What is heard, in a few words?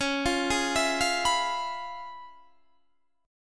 C sharp 7th Sharp 9th Guitar Standard, chord; arpeggiated; C#7#9; JGuitar